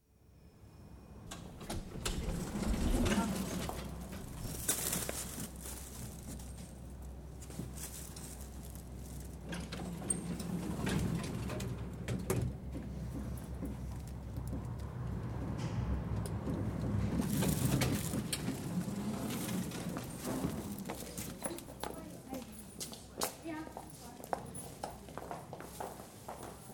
Hospital Lift, doors open, journey, stops at six floors (lift doors close, lift doors jammed open); Elevator; BBC